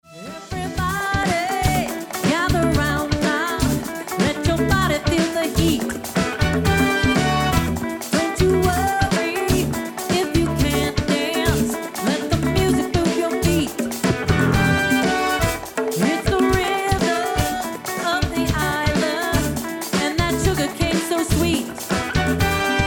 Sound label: Conga